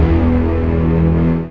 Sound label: SYN String Stro; Best Service Gigapack 1